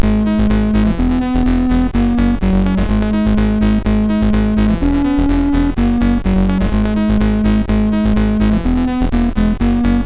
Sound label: MOS6581R3